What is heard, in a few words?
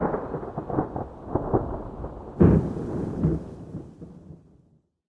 lobby landing; smite; chaac